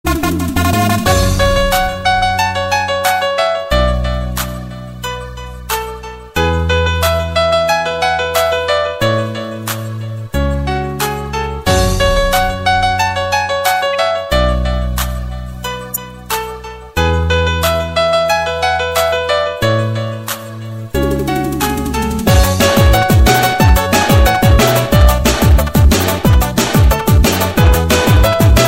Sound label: Cute Disco